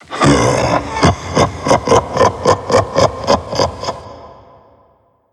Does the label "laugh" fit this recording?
Yes